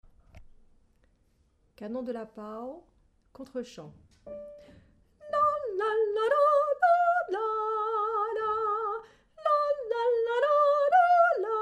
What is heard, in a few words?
canon